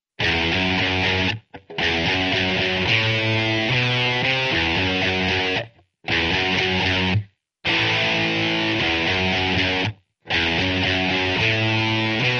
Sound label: Rock guitar; yao gun ji ta